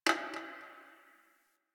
Garbage Metal Can; Warframe; Ambience; Nineteen Ninety Nine

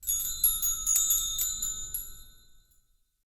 Hut Interior Chimes; Ambience; Midrath Island; Grandfather

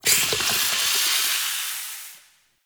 Gas Burst; Ambience; Bromius Inside

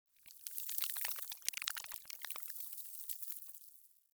Spine Move Close; Ambience; Misc Outdoor; Warframe; Infested Eris

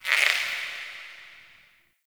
Critter Squeak; Ambience; Warframe; Nokko Colony

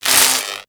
Lightning Rifle Spark; Warframe; Weapons; Corpus

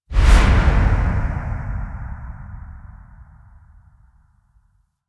Zoom Whoosh; gameplay; Warframe; NineteenNinetyNine; Stage11FuturePast

